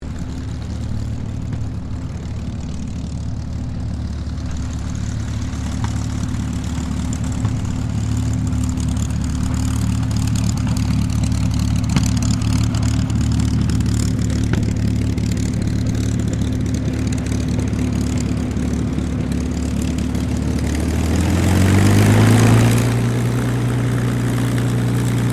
AIRCRAFT CA-18 MUSTANG TAXI; P51 /CAC-18 Mustang; Airplanes; Vehicles

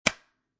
Foley, CD Jewel Case Close; Click; Multimedia

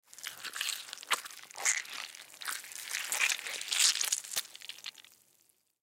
Surgery Squishes, Surgery Squishes Guts; Emergency

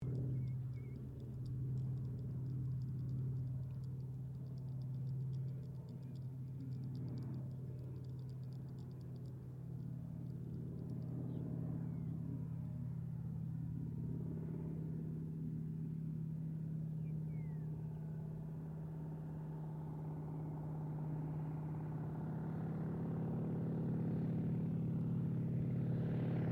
AIRCRAFT CA-28 CERES LAND; Airplane; Airplanes; Vehicles

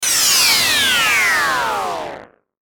Descend Distortion Buzz Power Down; Descending Sound Effects; Blastwave

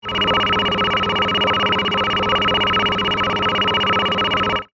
Scifi, Device, Atomic, Processor; Science, Fiction; Tech

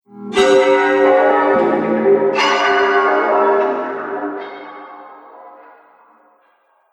Weird Scratchy Wires; cartoon sound design; Cartoon Sound Design Sound Effects

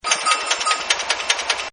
BELL MACH, Bells; Musical; Partners In Rhyme

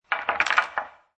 Billiard Pool Snooker Put Balls In Frame; Pool balls, snooker balls, billiards balls; Pool Sound Effects; Sports

